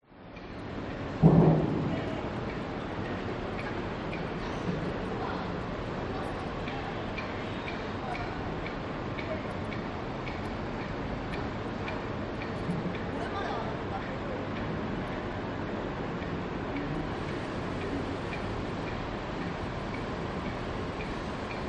public places city amb traffic dist construction under rail flyover few people; midday